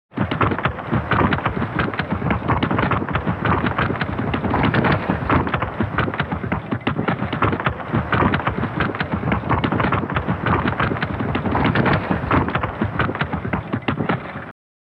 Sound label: Buffalo Herd Milling with Hoofbeats